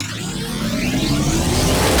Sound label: Charge Full; Warframe; Larkspur Prime